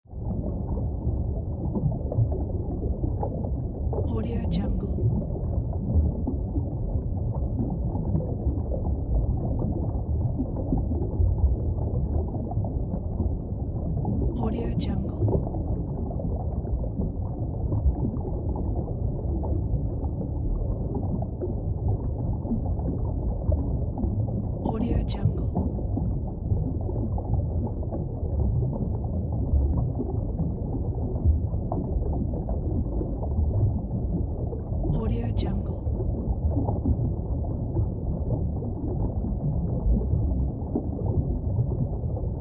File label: underwater